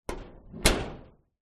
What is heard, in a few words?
door metal thin close gentle; household